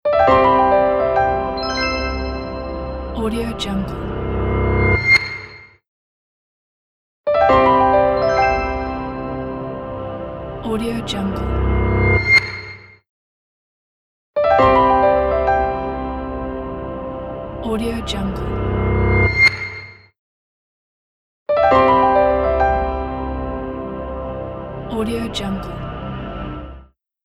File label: clean reveals logo music